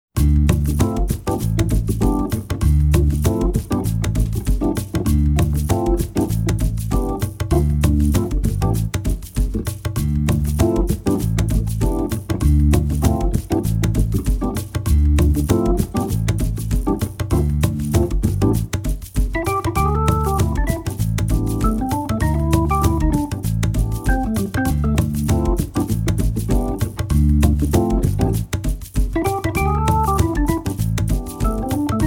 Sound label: Funky, orgues Hammond